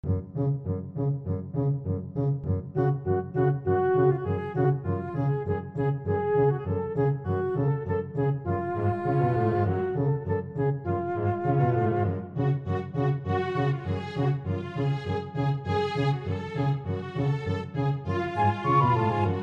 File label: danses des cygnets, flute